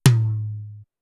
Tight Tom Mid, Drum Machines, Clavia DDrum AT, Pitched Up; 300 Drum Machines